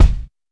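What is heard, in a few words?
RAW bass drum, Drums Acoustic; USB Soundscan vol.10; AKAI; RAW KIT 8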